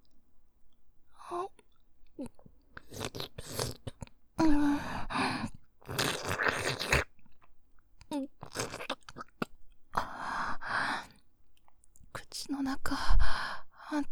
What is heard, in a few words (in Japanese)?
ふぅ、ん、しゅるしゅるっ、んふ、ジュルジュル、ちゅぱっ